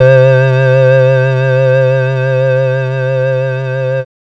Synths Note C

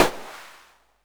Perc Hit, Noise

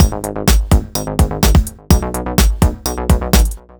Electro Pulse Full, 126bpm; Zero-G; Soundclash; Combi Loops; Nu Dance Electro

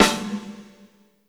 Snare, Live Kit; Sonic Foundry (Sony Creative Software); Ugly Remnants VOL-2; Single Shots; Beatstuff

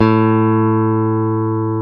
GTR, Dual Guitar; Club-50 - Foundations Roland

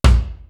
JAZZ KICK; East West; Global Sounds

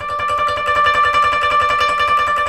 KOTO TREM

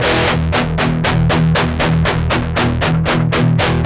8TH F 2, Guitars; Spectrasonics; Hans Zimmer Guitars VOL-1; 128-JACKHAMR

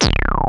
smack c0, Synths; fatman

